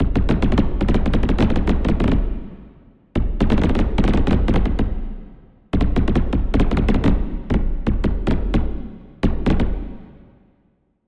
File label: Fireworks